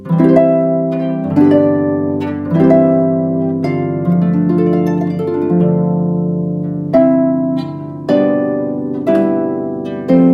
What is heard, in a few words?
harp